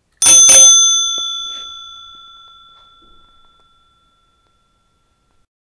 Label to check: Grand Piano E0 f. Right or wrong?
Wrong